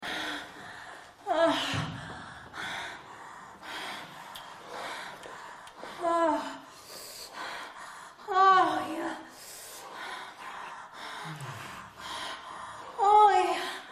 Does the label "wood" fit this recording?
No